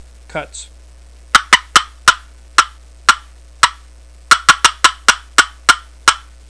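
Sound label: mahogany cutts, turkey call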